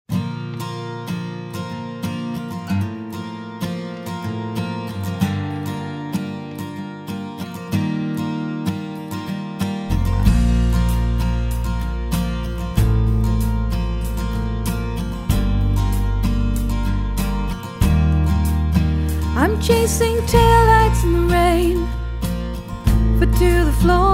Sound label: vocal version